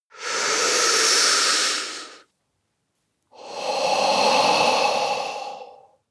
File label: breath male deep